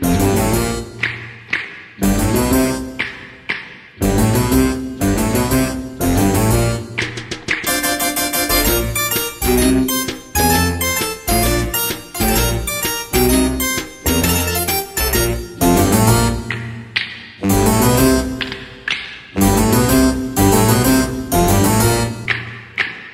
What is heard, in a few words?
childish ringtone